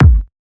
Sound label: Hard Drum Kick